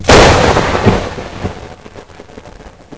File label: sharp thunder crack; quake2; fox